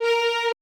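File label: violin quartet F F#A3; AKAI Advance Orchestra Upgrade 97 Vol.1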